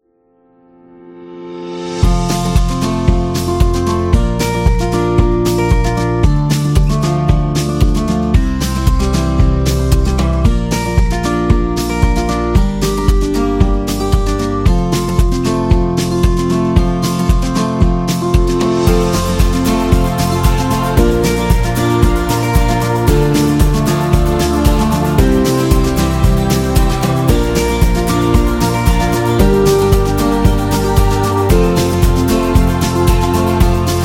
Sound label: The Guitar; waiting music